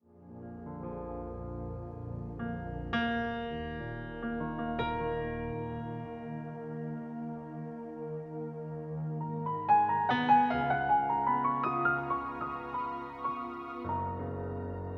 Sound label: Instrumental Piano Music Piano Love Song